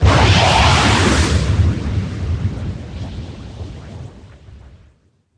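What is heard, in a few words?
combat explode tie interceptor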